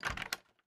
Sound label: Door Open; MiSide